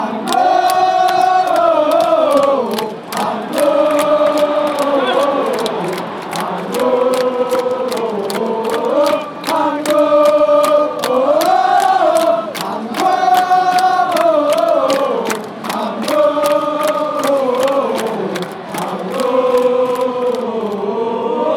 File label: hang oh fanchants